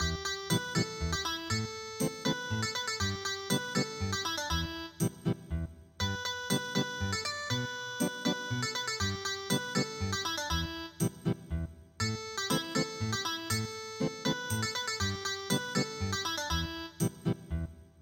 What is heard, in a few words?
chonan samugori Ethnic Guitar